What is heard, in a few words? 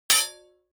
weapons medieval block metal small